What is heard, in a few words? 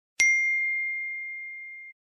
Ting Iphone Message Tone